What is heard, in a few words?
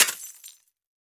Crash Glass Cup Smashing On Concrete; crashes; AdobeCC